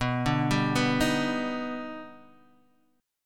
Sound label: Eb Augmented Major 7th B